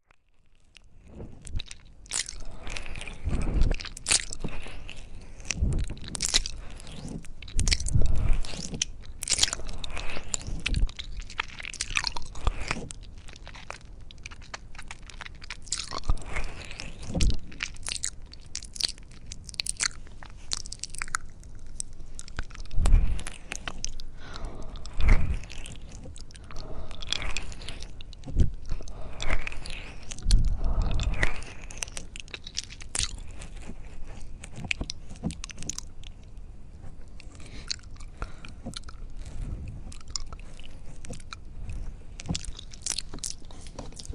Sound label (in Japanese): 美女の舌と唾液が絡み合いムラムラしてくる耳舐めASMR